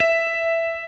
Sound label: Guitar & Bass; Quantum Leap